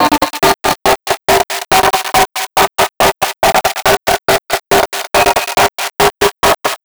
Envelope Beat; Drums & Drones

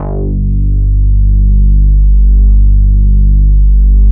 MOOG BASS; East West - Phat & Phunky CD2; 90's Sample CDs

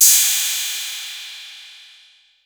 808, CYMBAL; CYMBAL P2

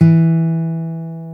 GTR, Dual Guitar; Club-50 - Foundations; Paul

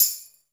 PERC -L, Drum & Bass, 160 BPM; Ueberschall; Drum & Bass Resonance

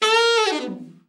ALT FALL, ALT-SAX FALL; Giga Samples Collection

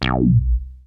WET THUMB, Siel; TECHNO-BWARE

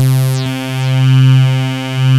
SYN DANCERS, SYNTHS; Pro-Rec - Synthsonic

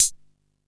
Hat, Drum & Bass

